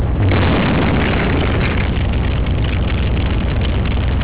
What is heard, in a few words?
thump